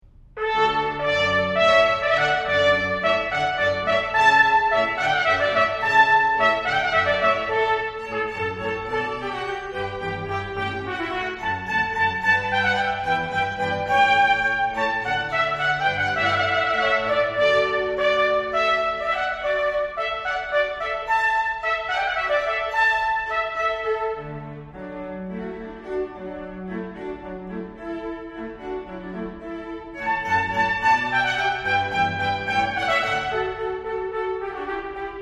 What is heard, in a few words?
Hornpipe, chamber; Hornpipe from Handel's Watermusic; rivers bend chamber samples